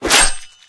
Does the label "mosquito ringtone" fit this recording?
No